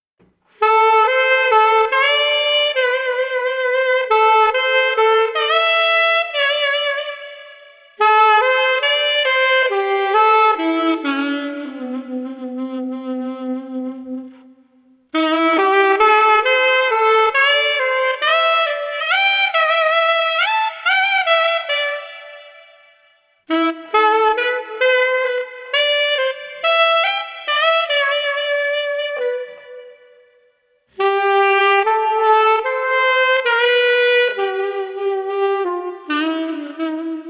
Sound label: Street Sax Ethereal Evening 110